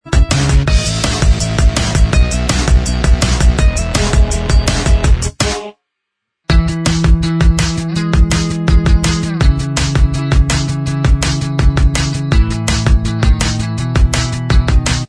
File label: Inst Track